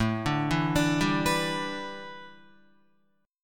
A 7th Suspended 2nd Suspended 4th, Guitar, A7sus2sus4 chord; A7sus2sus4 arpeggiated